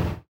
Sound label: stop, vehicles; aventador